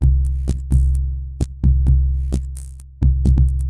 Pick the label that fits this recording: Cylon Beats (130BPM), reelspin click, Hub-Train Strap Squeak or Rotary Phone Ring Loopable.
Cylon Beats (130BPM)